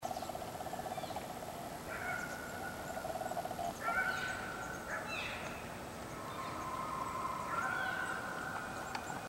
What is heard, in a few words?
cow mew, elk